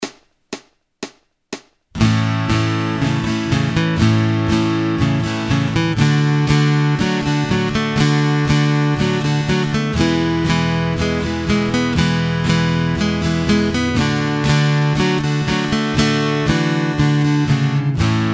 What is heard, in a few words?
Acoustic Accompaniment, acoustic guitar